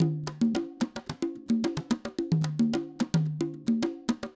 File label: Conga, acoustic percussion loop; Drums & Percussion; Percussion Loops